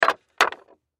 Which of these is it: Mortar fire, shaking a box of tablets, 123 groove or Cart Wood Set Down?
Cart Wood Set Down